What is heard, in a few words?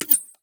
blinding dart; LoL; teemo